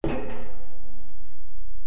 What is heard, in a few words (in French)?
pas metal; WehikulCzasu; Time Machine; Bruitages